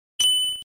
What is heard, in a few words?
ping